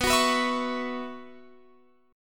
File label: B Suspended 2nd Suspended 4th, Guitar, Open F; Bsus2sus4; strummed; x,18,18,18,19,20